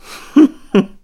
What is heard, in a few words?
Chuckle; Yuga chuckling (captured Osfala); Zelda